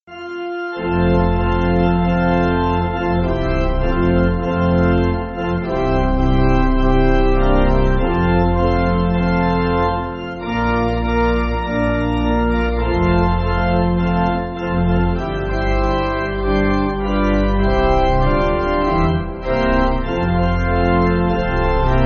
Pipe, church music

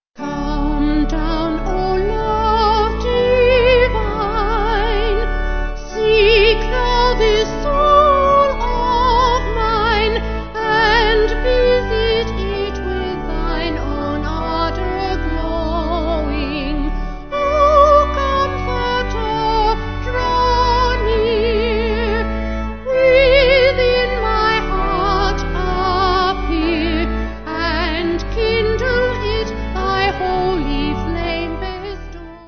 vocals organ

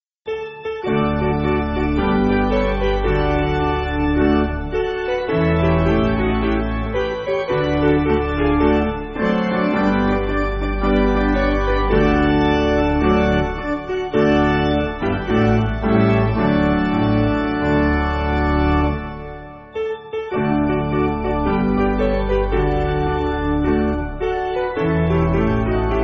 Hymnary Piano

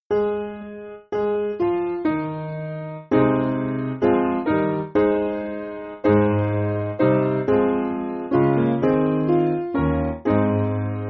Here Is Love Vast Piano; Simple Piano; 2/Ab; Pennant

